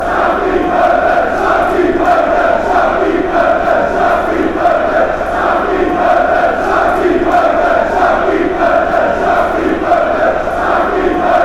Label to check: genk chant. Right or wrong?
Right